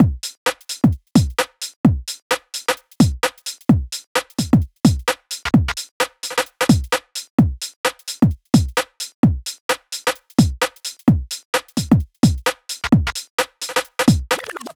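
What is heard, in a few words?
uk garage drum 130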